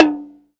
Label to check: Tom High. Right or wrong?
Right